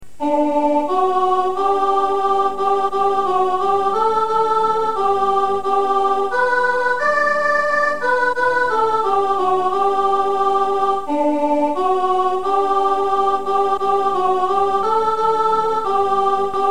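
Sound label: once in royal soprano; Christmas; carol tracks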